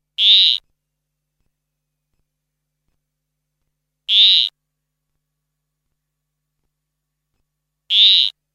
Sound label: Frog